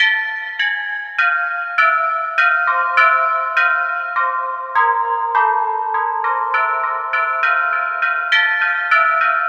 Pelog Unison Tube; Melodic; Ambient-Melodics-II

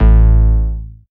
moog A; Techno Trance; Techno Trance Essentials

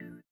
VIBRA; Spectrasonics; Metamorphosis

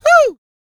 E YELL, MALE HUMAN; ILIO; Vocal Planet

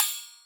crash, Millenium MPS-400

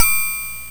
cymb, cymbals

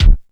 base drum; DRUM LIBRARY; DRUMS

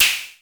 TECHNO PERCS; Crypton - DrumBase5000

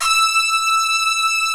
SYNTH ORCH

